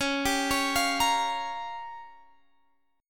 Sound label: Db 6th Flat 5th